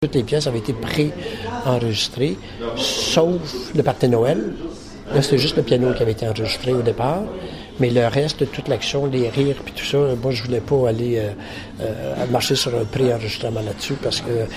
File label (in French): pieces musicales